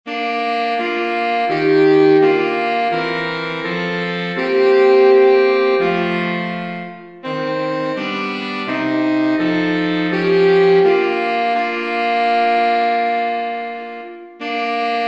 saxophone trio

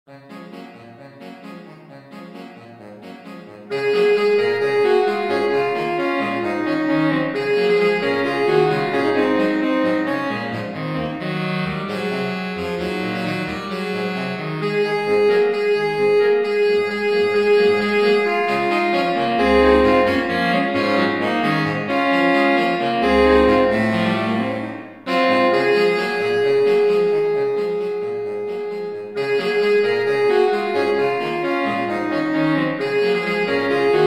saxophone trio

